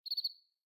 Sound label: Bird Cicada Notification Night; Xiaomi Redmi-8A